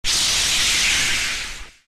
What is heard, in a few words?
encount, battle